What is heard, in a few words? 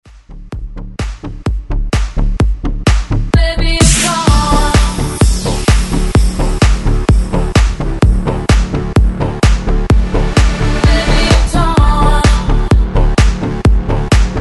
edm bootleg